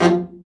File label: BRA BARI, Bariton Sax